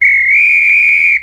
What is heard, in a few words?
Whistle